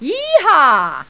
ayeehaw; GEOGRAFIA

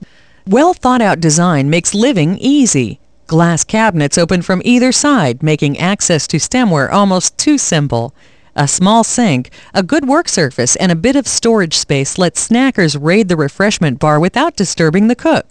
woo

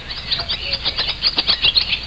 birds swallows chirping; Sound Effects for Multimedia - Volume 1

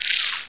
roach die; toyspace

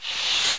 skid; dogz

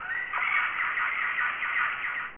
Monkey; Amazon Trail

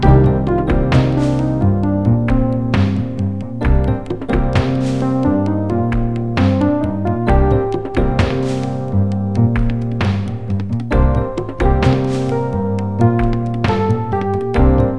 music

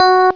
Elec. Piano (F#3); More Lemmings

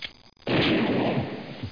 shotgun; 10,000 Graphics Pack Volume 1; Media Graphics International

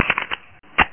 CLIP IN; duke3d

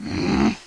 ANNOYED

